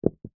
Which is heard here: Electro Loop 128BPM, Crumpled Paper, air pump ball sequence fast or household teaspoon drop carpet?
household teaspoon drop carpet